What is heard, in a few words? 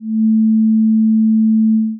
demo loop, thin chord